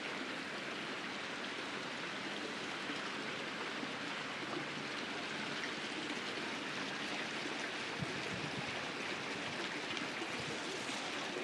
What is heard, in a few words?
spanish water